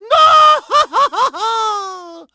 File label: burned to death; Mario; Super Mario Sunshine